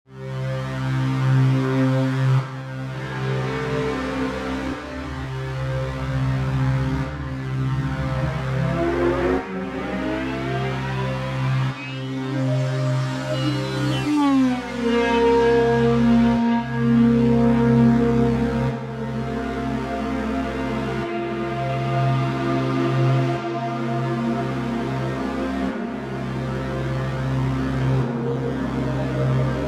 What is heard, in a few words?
arturia efx fragments